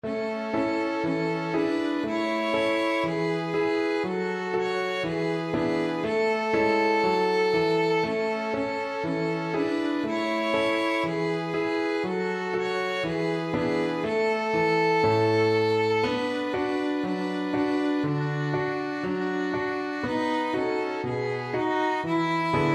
piano trio

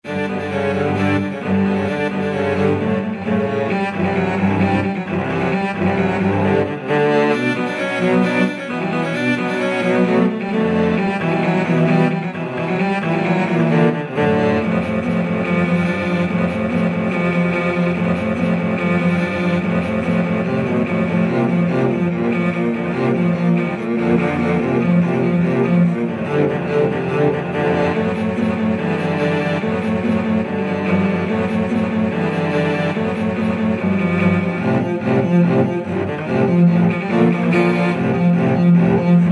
cello quartet; romanian folkdance; bartok

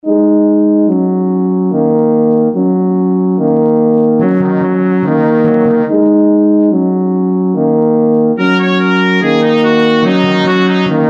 brass ensemble; janacek sinfonietta fanfare

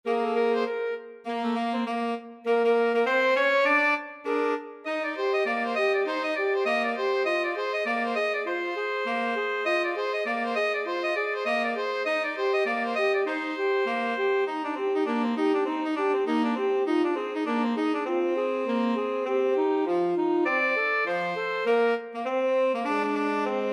2ASAX TSAX, 2 altos tenor sax

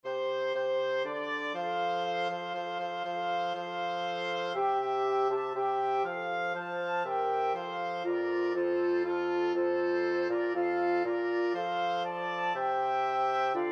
flexible mixed ensemble, 4 players

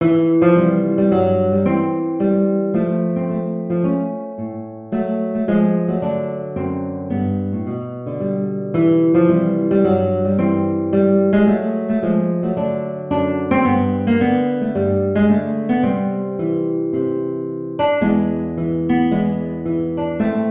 guitar

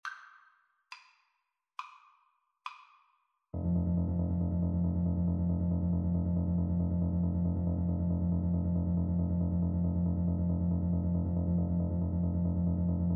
also sprach zarathustra, oboe

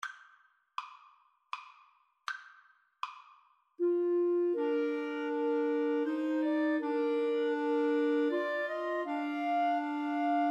Clarinet Trio

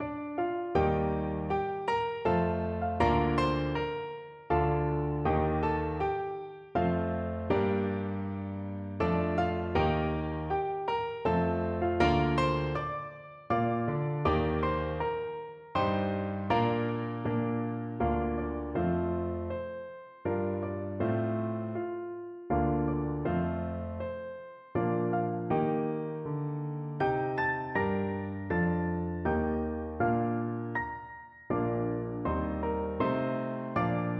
holst jupiter, piano duet

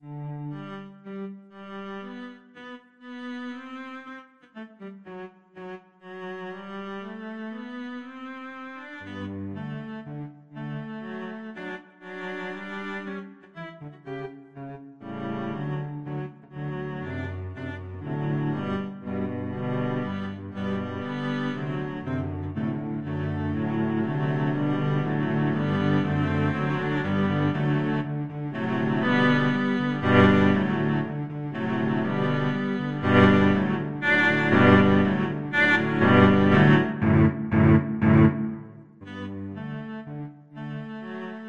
cello ensemble

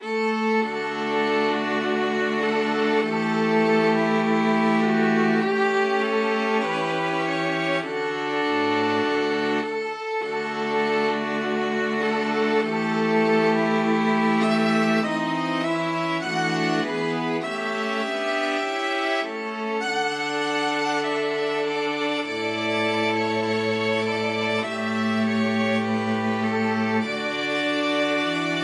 america the beautiful, string quartet

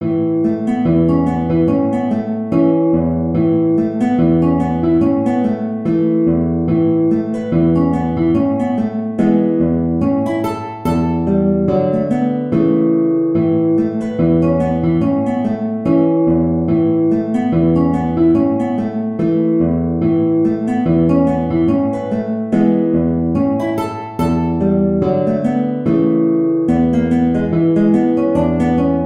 Reel, guitar; Caymann

